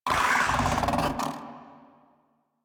Catbrow Combat Vocal Attack Medium; Warframe

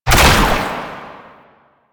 Ranged Weapon Fire; Warframe; Necramech

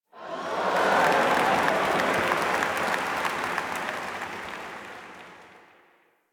Amphitheatre Crowd Surprise; Ambience; Duviri; Warframe

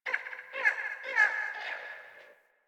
Landscape Mid Creatures, Ambience; Warframe; Venus Open World; One Offs

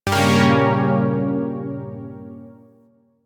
Shawzin Phrygian Chord H; Chords; Warframe; Zariman

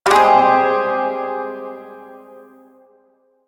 Shawzin Phrygian Chord K, Music; Chords

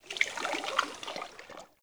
Cavernous Water Lap Rock; Ambience; Dungeons; Under City

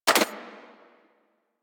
Rifle Mech; Warframe; Weapons; Thanotech

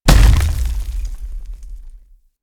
Infested Step Default, Enemies; Warframe; Femur

